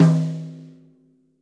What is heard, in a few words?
rack tom - snares on; JazzFunkKit